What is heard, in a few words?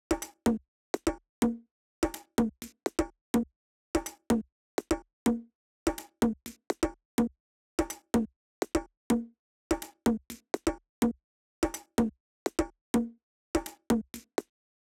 Conga